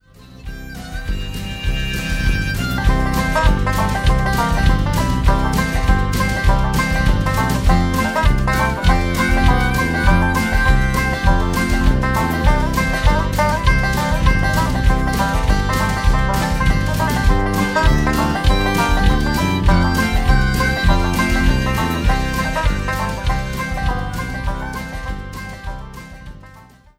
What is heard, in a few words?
Country Polka